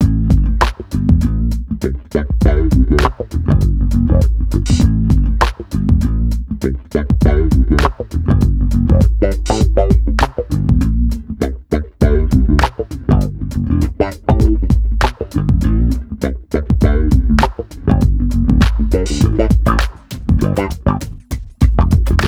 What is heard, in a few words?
Big E On Da Bass; toejam and earl back in the groove original game soundtrack